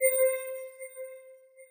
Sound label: alert echo